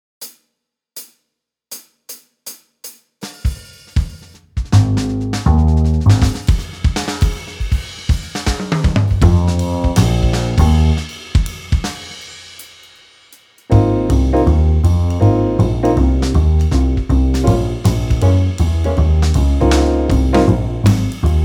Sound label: bright swing 160; Etude Backing Track